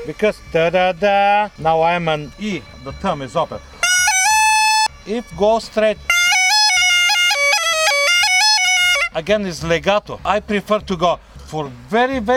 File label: Chetvorno Line Ornamentation, Small Gajda